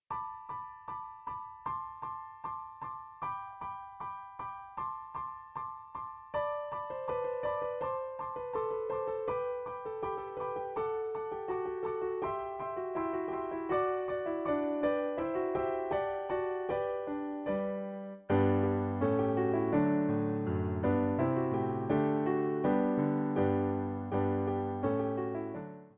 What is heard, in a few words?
Ding Dong Merrily On High